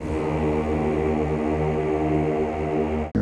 CHO, Roland; Sound & Vision; Gigapack I CD 2; CHO Zzzz + Vvvv; CHO Vvvvv

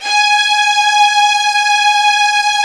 G#4 violin; VIOLIN1

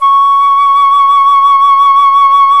FLUTE, Flt vib; Roland L-CDX-03 Disk 1

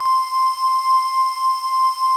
BOTTLE PADS; Pro-Rec - Synthsonic

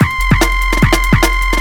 FX BEAT

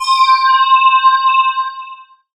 Ambient Atmos, Dance Synths

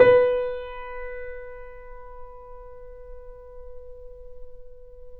Mp B 2 off, Grand Piano off mic; AKAI S6000 CD-ROM - Volume 2

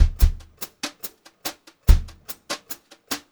Brushed Drum Loops; USB Soundscan vol.39; AKAI

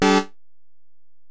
Bell, Alesis DM-PRO

